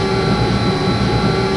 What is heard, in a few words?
Motor, Train; H-Start 415 Stadler Flirt